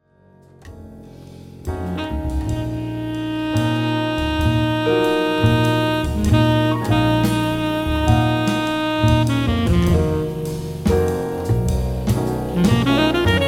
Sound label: trio